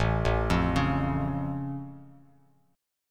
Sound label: A Augmented A down Bass Standard; arpeggiated; JGuitar